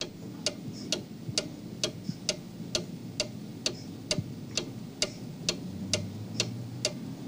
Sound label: Clock Ticking Rhyth, ticks